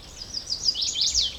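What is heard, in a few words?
song chestnut warbler maine; the song is typically rendered as "please please please ta meetcha."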